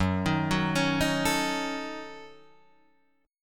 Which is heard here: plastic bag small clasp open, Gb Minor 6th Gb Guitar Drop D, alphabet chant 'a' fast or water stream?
Gb Minor 6th Gb Guitar Drop D